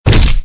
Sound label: fall